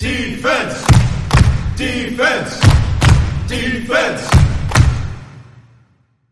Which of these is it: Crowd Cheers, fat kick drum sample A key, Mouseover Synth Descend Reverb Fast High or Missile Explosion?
Crowd Cheers